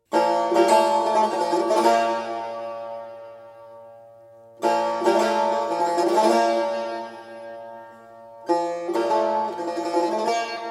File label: Saz